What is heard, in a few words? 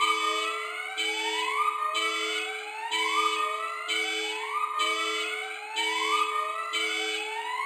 Car alarm; zps; Alarms